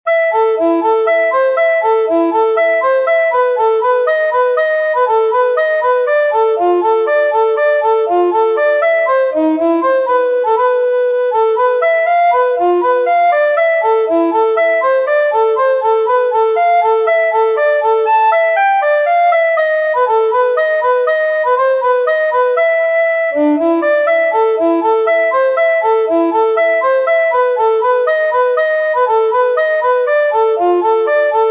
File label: arpeggio